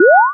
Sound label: shot rail